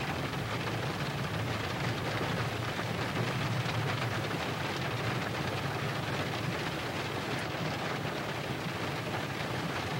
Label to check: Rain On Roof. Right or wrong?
Right